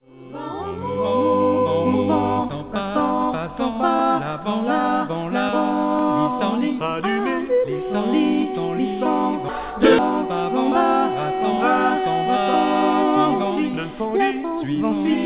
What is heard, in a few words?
vocal